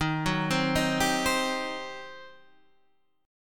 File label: G Major 7th Suspended 4th Sharp 5th, D sharp